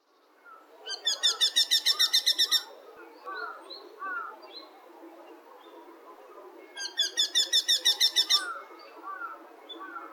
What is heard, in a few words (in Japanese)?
アリスイ